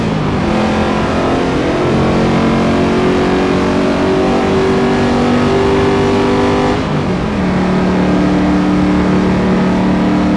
fourth cruise, vehicles crown vic

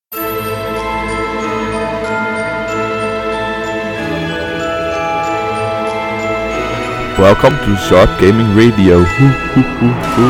voting music

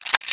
ak47 bolt pull, weapons; fg42

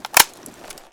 clip in, f2000

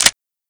clip in, model500

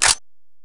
slideforward, weapons; svd

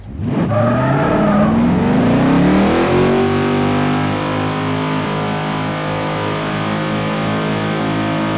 rev, vehicles crown vic

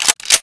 pump, weapons; tact870

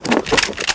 1897 deploy, weapons 1897trench

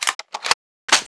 gun reload; alyx gun

